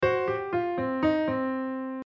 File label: double min pen